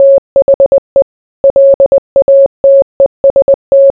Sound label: morse